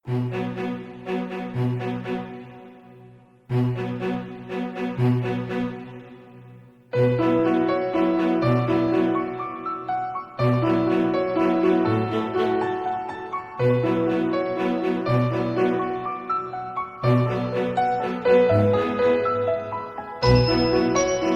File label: questioning orchestra